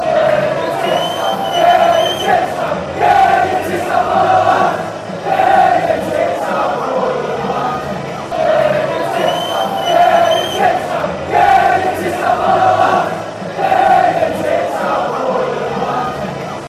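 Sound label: get em out lads sufc, football chant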